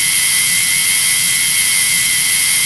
smg loop, weapons

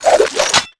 whip sword stab end

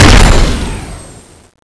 plasma exd, weapons

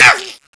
fly zombie hurt

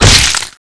headshot

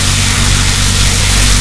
link gun light shot hit, weapons

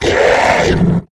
wolf cry; wolfteam

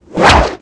whip sword stab

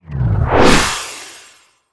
revive gun clip in

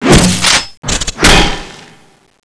lance special attack, sound/weapons

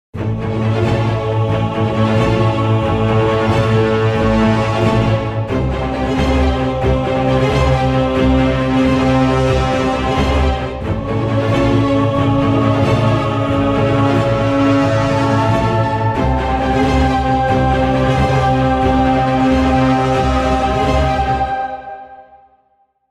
Zombie Z - Round Start; CSO

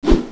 katana swish

